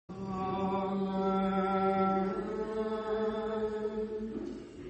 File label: Amen